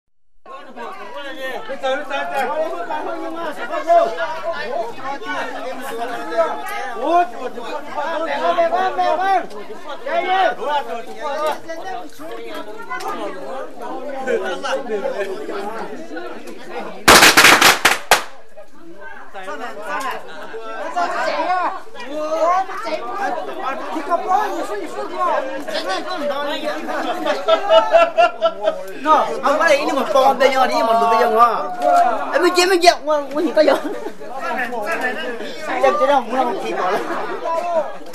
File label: Lisu party